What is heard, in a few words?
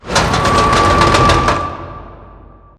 Prop Warehouse Prop Rack Rotate; Titanfall 2; Boomtown